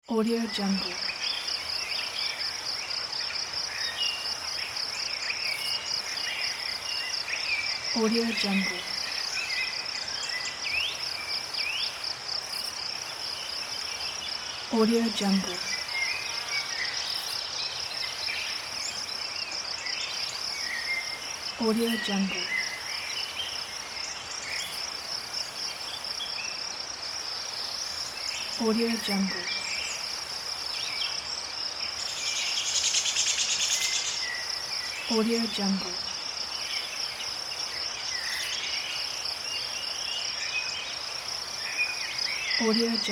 morning nature

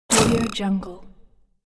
burst of data

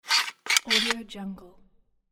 mechanical item equip

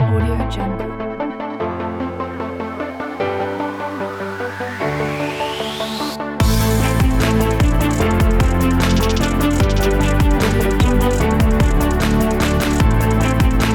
the corporate inspiring industrial motivation; soundsax

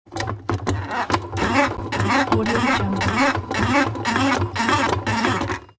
telephone old windup cranking slow

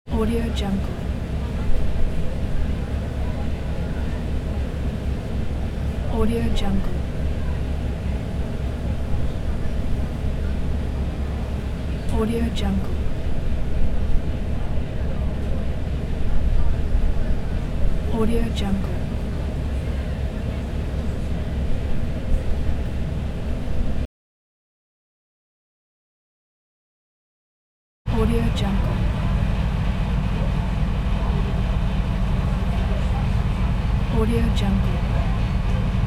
passenger ferry inside

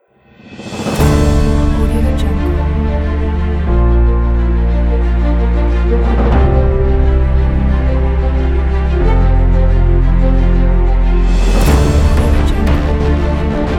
epic cinematic trailer

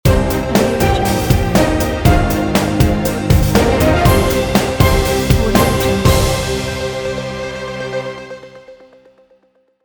breaking news intro logo

